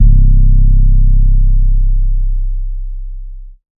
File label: 808 bass for drill music